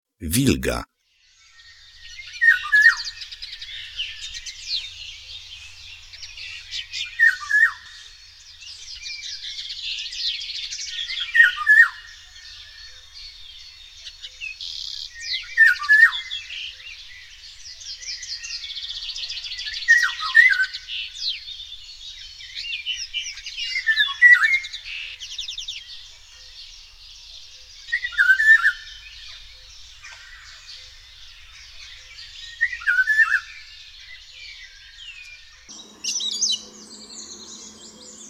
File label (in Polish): WILGA